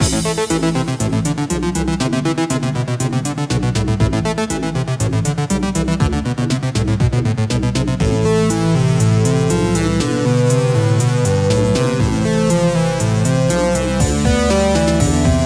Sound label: Electronic Battle Theme